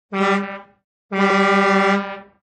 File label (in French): klaxon camion